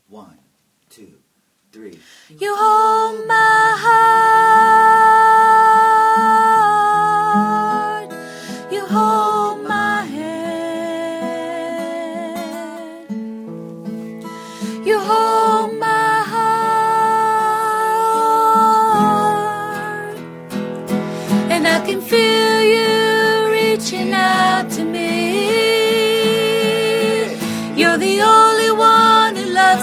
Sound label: Soprano